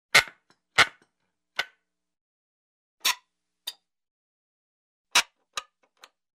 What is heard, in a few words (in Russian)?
gvozd vytaskivajut iz dereva